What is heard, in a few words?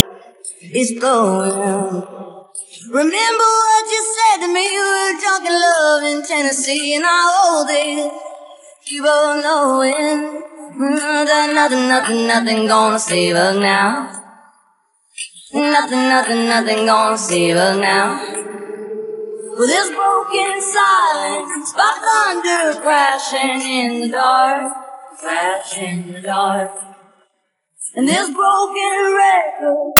vocal